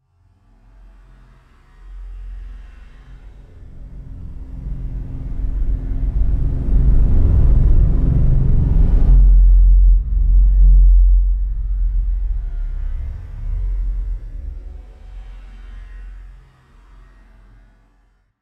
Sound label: Spaceship Fly Long Sci Fi Woosh